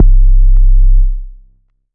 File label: Basic Sine Wave C1